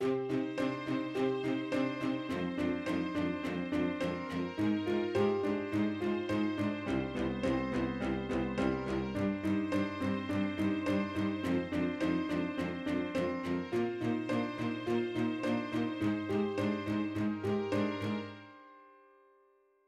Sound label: Piano B up half octave